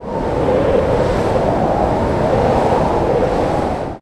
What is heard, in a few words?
ambient battle; World Of Warships